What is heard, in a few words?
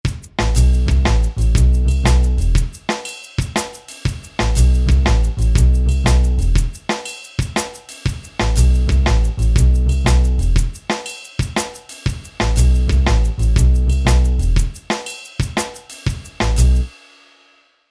Jazz